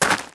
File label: Step; Harpoon